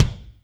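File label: kick Amb Loose, Alesis D4 drum machine; 300 Drum Machines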